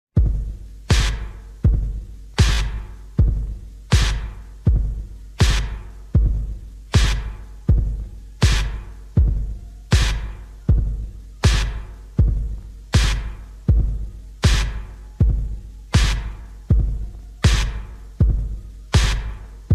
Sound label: slowed reverb